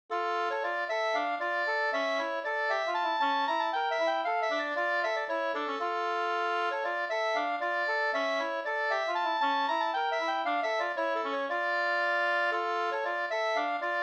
polska polska

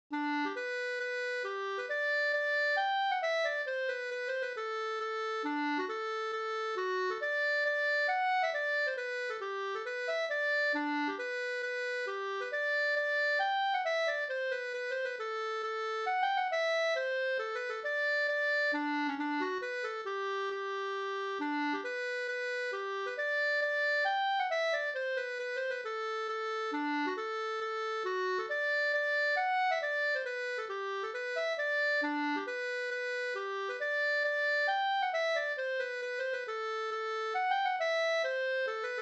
folkdans, polska; Ekebypolska

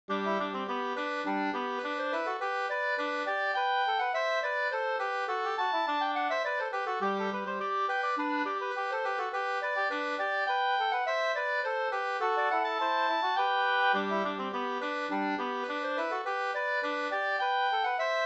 slängpolska

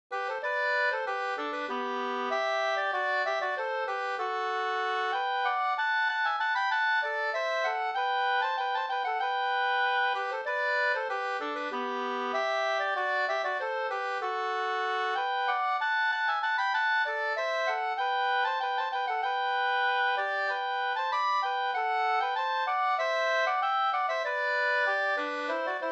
skanklat; Tabergs brannvinslat Rattvik

